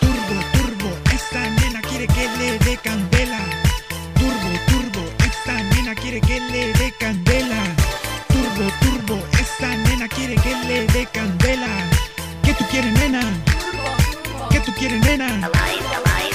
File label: Turbo Chorus